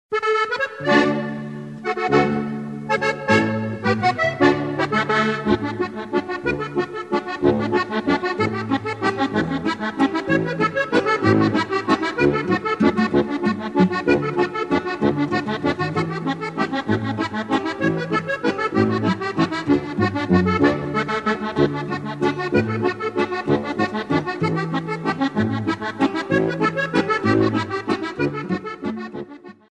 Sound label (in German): landler